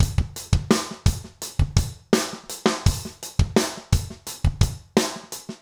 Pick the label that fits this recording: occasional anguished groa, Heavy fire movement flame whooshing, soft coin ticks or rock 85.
rock 85